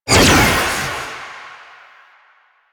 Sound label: Jade Scythe Proj Whizz; Weapons; Stalker; Warframe